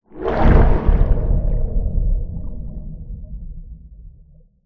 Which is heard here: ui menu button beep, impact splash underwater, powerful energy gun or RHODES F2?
impact splash underwater